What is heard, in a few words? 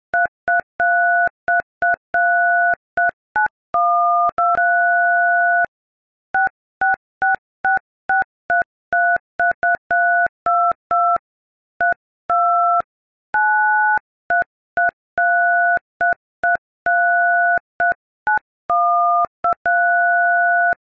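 tonovyi nabor nomera, tone dialing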